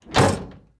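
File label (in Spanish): CERRAR PUERTA METAL, close metal door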